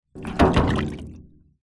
water tank wood batton drop into full small water tank water splash; industrial; metal impact; zapsplat